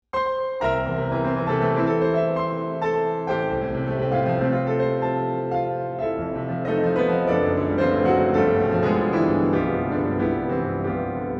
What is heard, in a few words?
Brahms intermezzo in hall